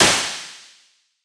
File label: EMT short modeled